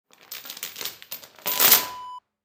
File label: chain drop